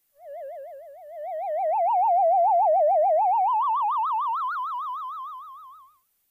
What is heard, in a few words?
volume roll off, theremin; AbdulsJaycar